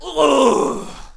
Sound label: Hwacha Death Vocals